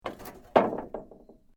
Metal Sheet Move; Materials

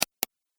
Pc Mouse Click And Release

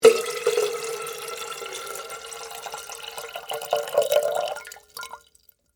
Water Pour Teapot, Into, Metal; Pouring

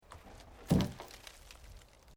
Wood Logs Movement Minor

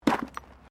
JUNK, Debris; Material components for constructing more complex sounds; Materials

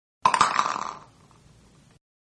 Golf Ball dropping into cup; Golf (Sound Effects:Sports); Manhattan Production Music

